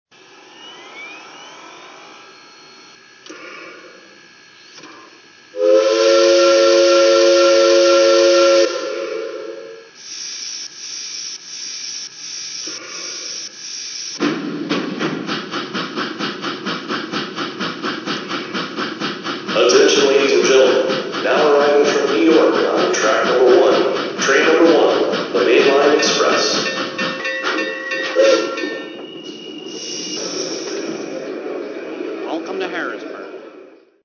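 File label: 442 Atlantic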